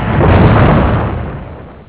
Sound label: open valve